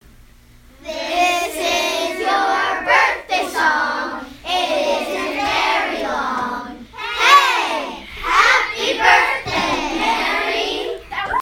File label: happy birthday sung